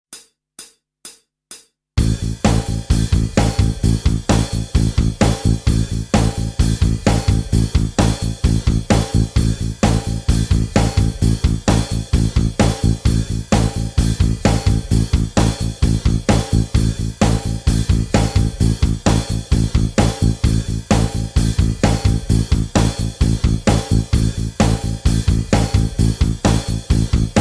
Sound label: Play rhythm better lead and rhythm backing